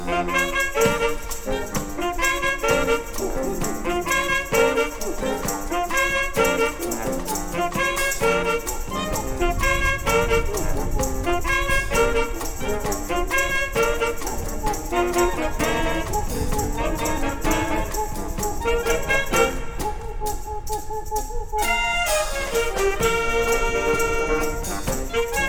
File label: concert band